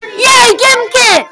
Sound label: yay; gimkit